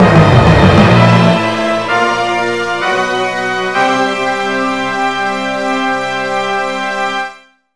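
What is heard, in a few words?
BGM Tornado; Tornedo2; Irodzuki Tingle no Koi no Balloon Trip; NDS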